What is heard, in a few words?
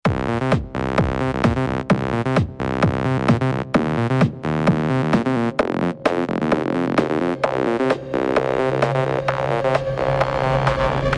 Electro Boost; The Ultimate Groovitron Collection